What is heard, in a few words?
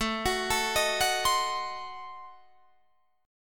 A Diminished 7th A down Guitar Drop D